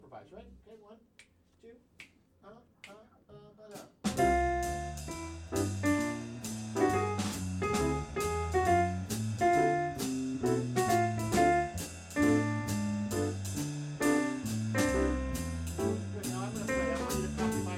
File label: jazz Solo